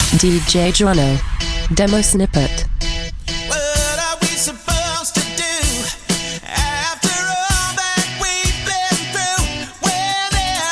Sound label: Em 128 bpm